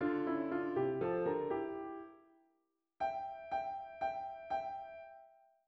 Appunto per pianoforte

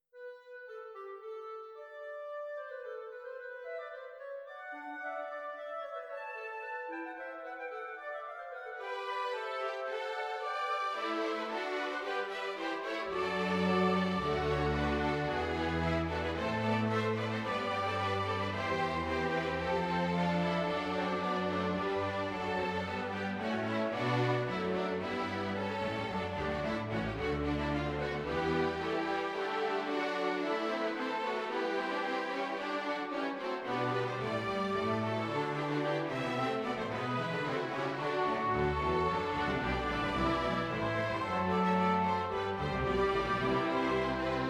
in sol maggiore Orchestrazione; Ronge; Gerd Prengel

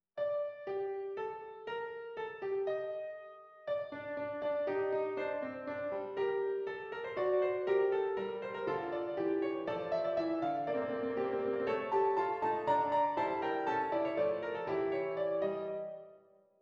Fuga; da Haendel

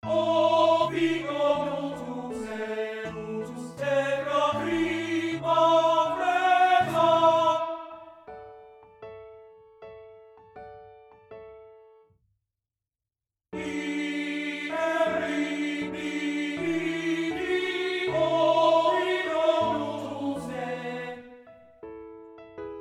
Rondo Allegetto per pianoforte; Rosetti

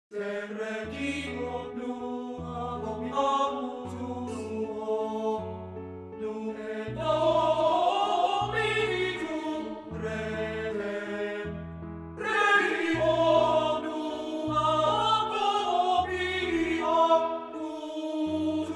lied per voce e pianoforte; Rheinek; An ein kleines melanchonische Madchen

